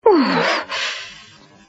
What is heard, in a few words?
oooo, voices